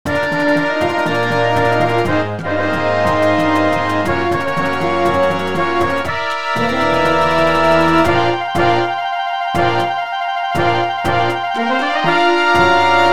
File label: Battle Hymn full score